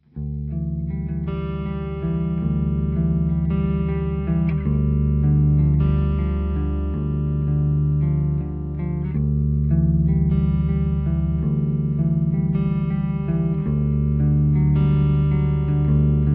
GTR Sweet Honey Dynamic, On electric guitar, testing the dynamic response, from clean to overdriven